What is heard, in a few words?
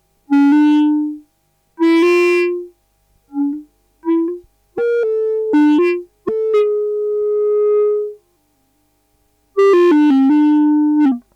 pink piano